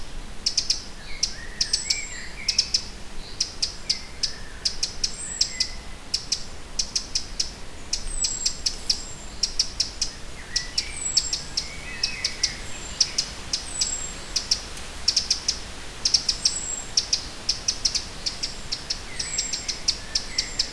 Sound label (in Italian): verso canto scricciolo troglodytes eurasian wren chochin comun; bioacustica